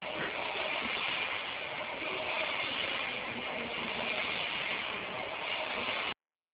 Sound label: center low